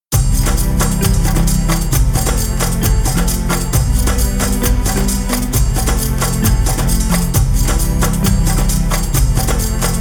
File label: African Theme